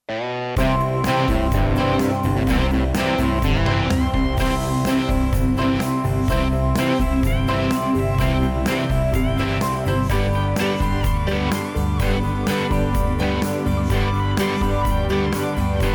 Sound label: rhythm track